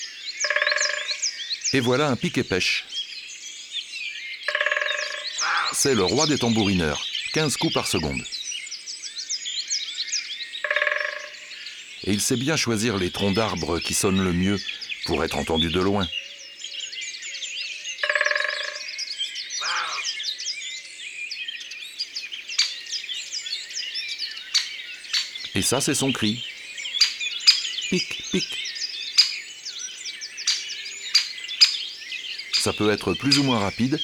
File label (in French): Pic epeiche; abc de la nature